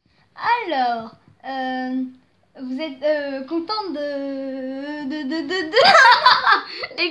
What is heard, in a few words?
Vocal